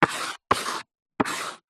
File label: office chalk draw strokes lines blackboard x3